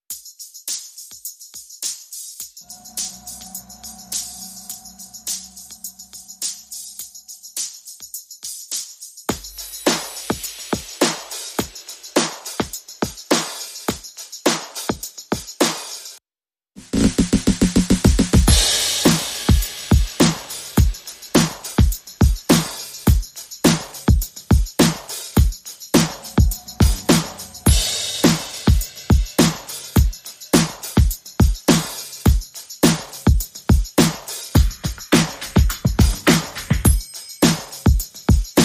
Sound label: Drums Only